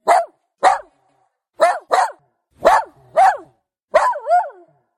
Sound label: Dog Tone